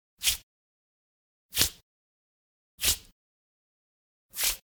Generic Slide; boom library; The Interface

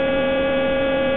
Sci-fi Door Loop; SFX Kit; Sci-Fi Loops

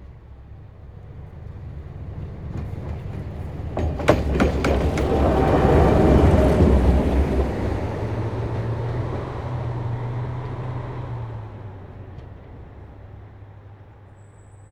train rolling; best service Blue Box

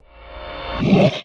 Spirit Mists Heavy Attack; Blind Quest - The Frost Demon

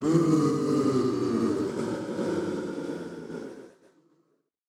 Crowd Booing; Pitch Black Audio Pong

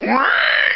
pig; Dice World

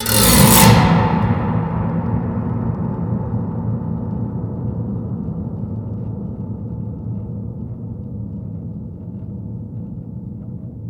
Long Scrape, Cinematic Metal Impacts

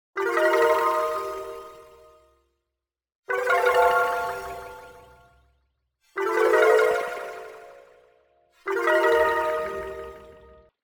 Arcade Click Positive; boom library; The Interface

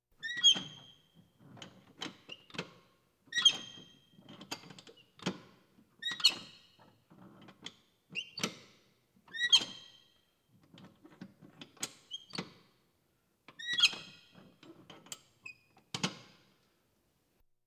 Police, Fire engines

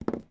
Tap Fingernails On Desk; SFX Kit; Foley - Misc

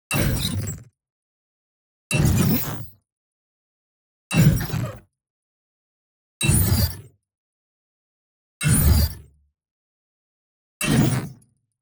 HIT SMALL Electric Fragment Debris; NEON; boom library

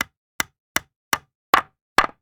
Nail Hammer into Wood; Tools; 1001 Sound Effects

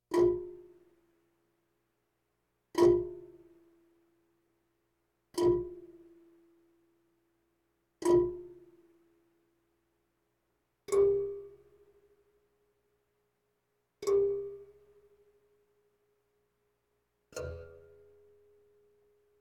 Percussion Bongos Tympani rolls Gongs Drums

